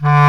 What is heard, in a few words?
BASS CLAR D2, WIND